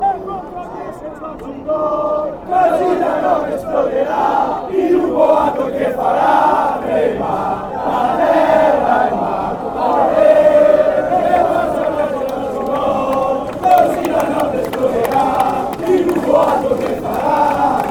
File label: come on score a goal